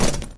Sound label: item pack pickup